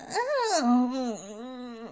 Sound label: dying; toadette; SM64 CO-OP